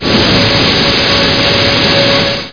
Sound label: repair